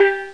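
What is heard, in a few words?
Steel Drum (G3); X-Wing Demo; X-Wing Patches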